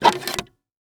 Technology Electronic Machine Wood Record Player Removing Record Quickly